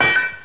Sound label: pot lid